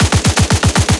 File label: loop m2, guns tanks; War Thunder